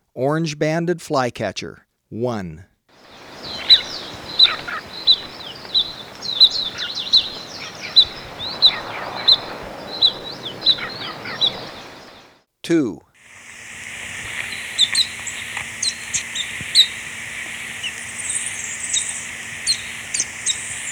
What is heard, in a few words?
Orange-Banded Flycatcher, Birds of Ecuador; S. America